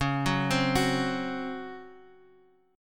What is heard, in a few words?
Db Major 7th Suspended 4th, Guitar, Half Step Down